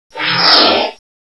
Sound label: big flinch; rheptor